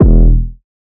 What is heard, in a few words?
808 Bass Baby E3; Slate 808 Deep Dive; SD Signature 808s